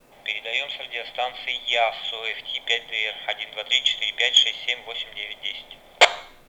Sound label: yaesu ft 5d tx